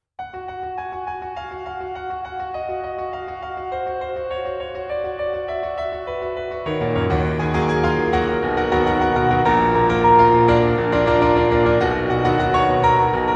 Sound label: Piano